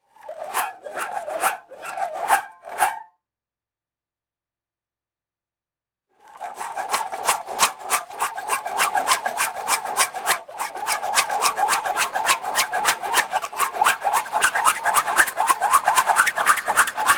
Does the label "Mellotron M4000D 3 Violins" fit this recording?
No